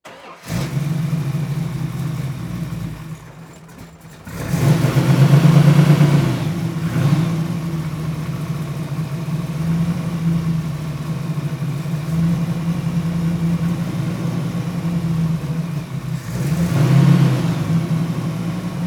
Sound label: Car 31 Ford High Boy Start Almost Stall Idle Off Mic In Front; transportation